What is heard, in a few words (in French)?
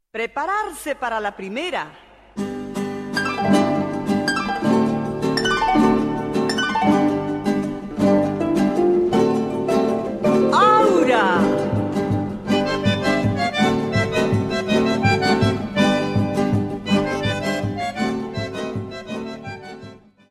Gato, Argentine